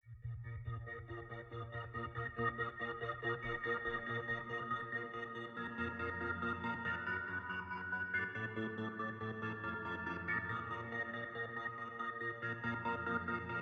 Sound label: 140 A#m